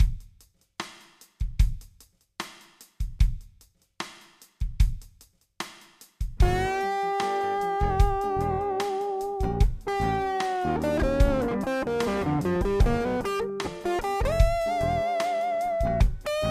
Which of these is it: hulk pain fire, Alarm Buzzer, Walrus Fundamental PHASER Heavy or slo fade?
slo fade